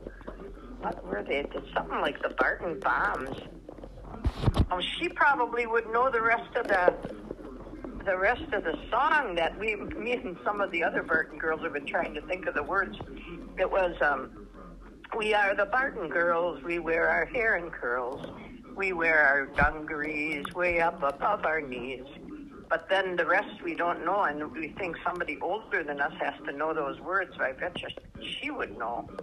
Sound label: girls song